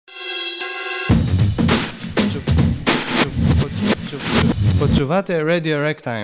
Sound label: break, jingle